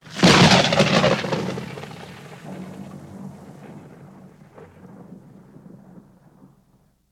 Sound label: Thunder Crack